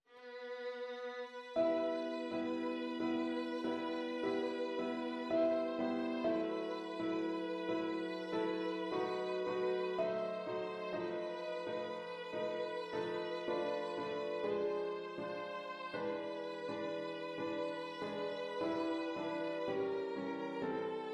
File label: Prelude in E Minor